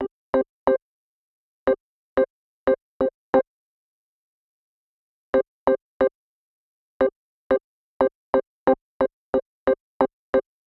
Rhodes, Cinematic Funk; Sonic Foundry (Sony Creative Software); Urban Tone Poems

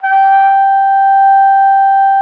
G5, TRUMPET; Best Service ProSamples vol.42 - Session Instruments; PS-42 Session Instruments

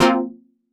stab tite, stabs

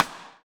SD RI, SD Rim; Sound & Vision - Gigapack I

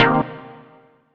Stab C3, Club Techno; Zero-G; Stabs

